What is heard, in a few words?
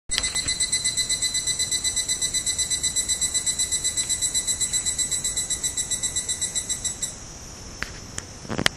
higurashi